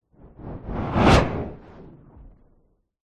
science fiction ufo spacecraft small spinning fly past